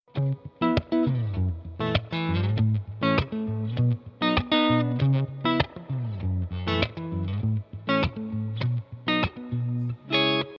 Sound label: electric guitar riff